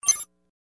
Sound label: Highpitched Compute